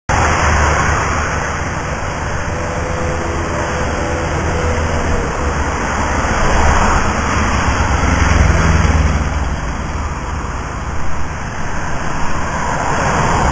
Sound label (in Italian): audio traffico veicolare; rumore